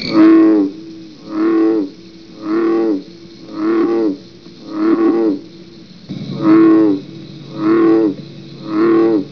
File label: bull frog; frogs toads; wildlife